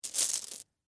Marble Stones Up, Texture, Marble, Stones, Pick, Up, Handful; Miscellaneous Toy Sound Effects; BlastWave